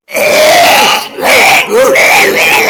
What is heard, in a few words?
crazy man barking